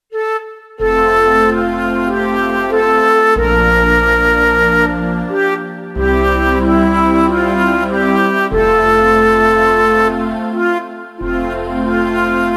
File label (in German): vogel fliegt wind live floete